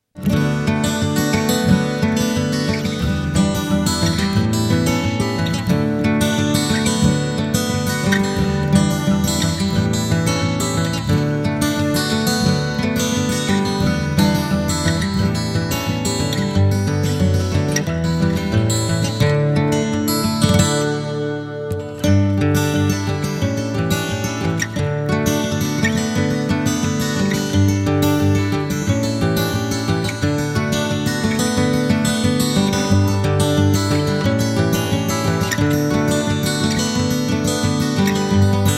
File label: Wood Wind